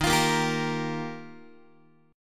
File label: D sharp Major Add 9th, Guitar; strummed; D#add9; x,11,8,8,11,9; JGuitar